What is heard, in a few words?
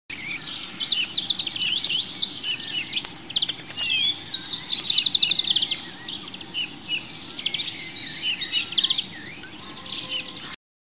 birds tweeting